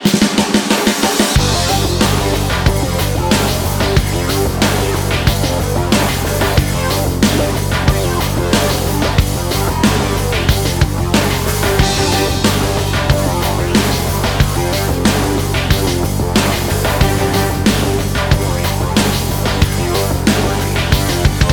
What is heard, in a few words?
Action Low; Emergency 5